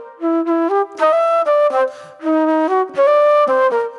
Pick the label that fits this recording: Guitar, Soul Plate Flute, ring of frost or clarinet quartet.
Soul Plate Flute